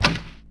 Bow Release; Civ4 warlords

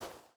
footstep burlap sand walk; Rust

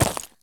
sprint slate; Escape from Tarkov

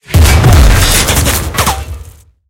tank hit he mid; tanks; War Thunder

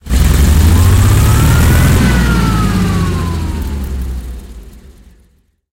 hetzer engine load, tanks; War Thunder

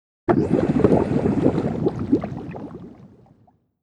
player scuba bubbles stereo; Modern Warfare

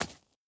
stag footstep dirt hard; Rust

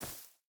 footstep burlap grass run; Rust

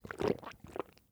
drink; Rust

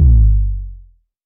damage hits explosions

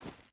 footstep boots stones jump start; Rust

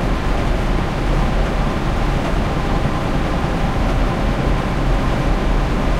panther engine int rpm, engines tanks; War Thunder

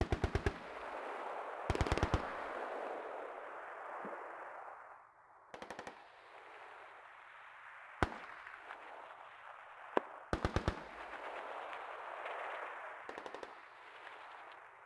automatic, combat atmosphere

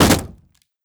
door impact wood; Rust

